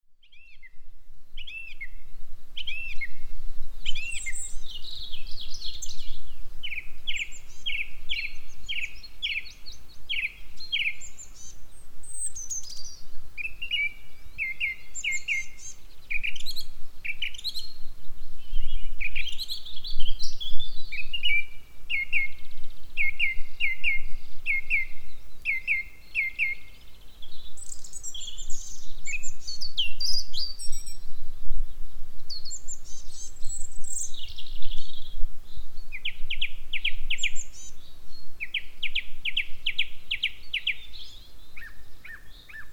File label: Easter Noise